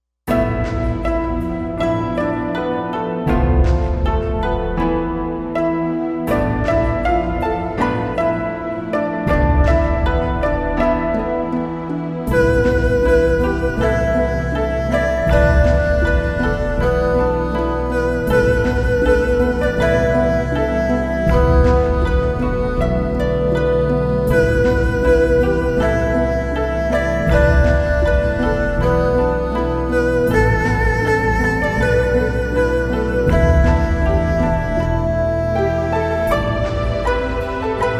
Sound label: bgm login; Ghostsoul Online